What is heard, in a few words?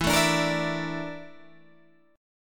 Bb Major 7th Flat 5th